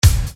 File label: Bass Dr, EuroDance Bdrums